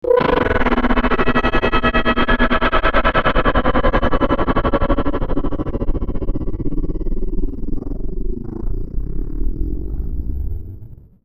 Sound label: spin